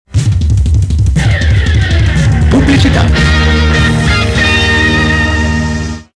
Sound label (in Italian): jingle pubblicità; carrellata di spot